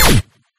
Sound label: Cancel; Life of a Naomi